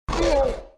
Звук о помощи велоцираптора

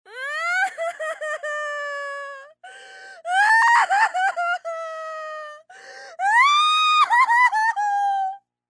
Звук плача женщины на похоронах

